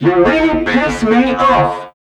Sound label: VOICE FX, Dance Vocals